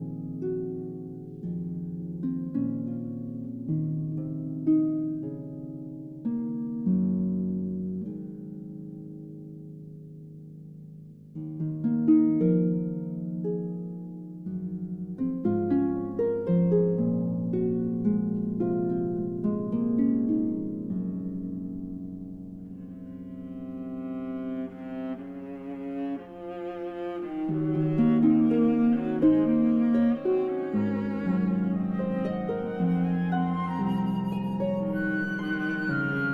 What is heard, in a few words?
Harp Concerto Lullaby